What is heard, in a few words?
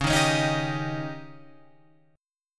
Db Suspended 2nd Flat 5th, Guitar, Open F; 13,10,13,10,10,10; strummed